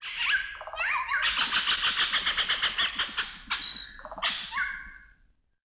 Jungle Bird; Ambient; Civ4 warlords

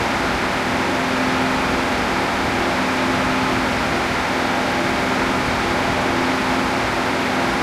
m4a3 engine int rpm 99, engines tanks; War Thunder

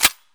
ak74 raw close slider; Escape from Tarkov

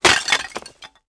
ceramic pottery; World of Tanks Blitz

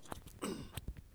open; zvezda; Escape from Tarkov

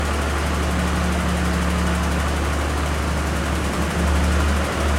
load exterior engine loop, engines tanks; War Thunder

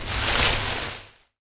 hay stack; World of Tanks Blitz

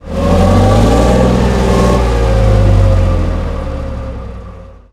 chieftain engine load, tanks; War Thunder

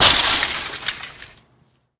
fence metal small crash; tanks; War Thunder

